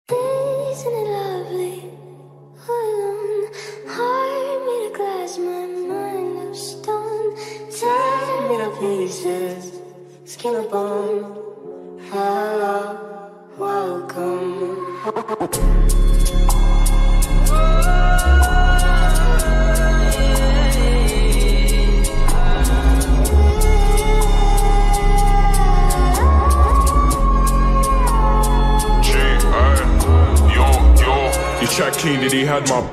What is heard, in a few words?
slowed reverb